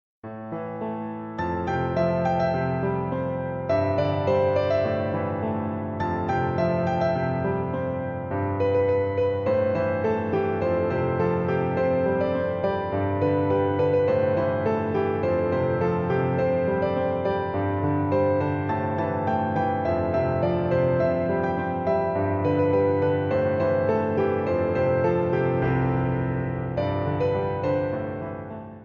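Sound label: whistle piano instrumental; Electronica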